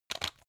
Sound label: pepper mill; soundpool